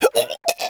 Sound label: vce die